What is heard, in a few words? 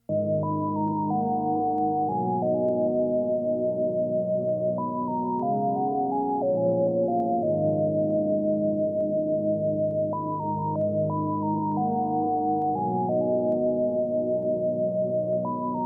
OPERATOR 1 BEAT